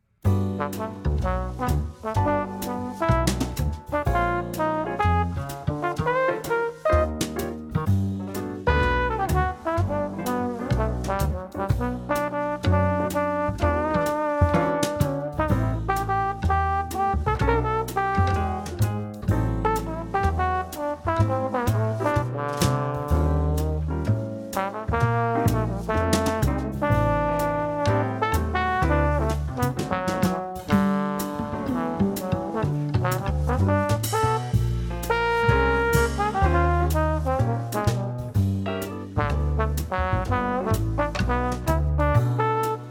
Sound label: solo